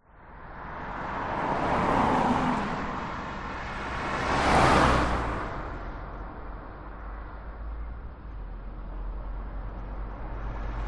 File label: transport traffic pass by main road 60kph from open window car